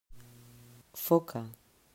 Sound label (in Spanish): foca